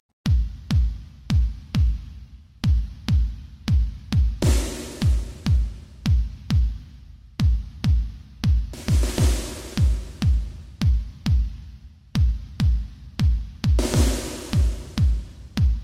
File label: Ambience